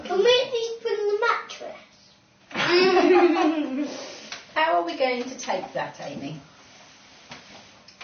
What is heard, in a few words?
laughter exercise